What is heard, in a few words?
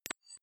click hover, signale; soundpool